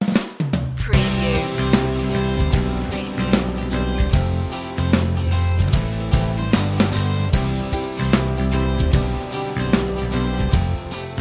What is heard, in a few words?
no melody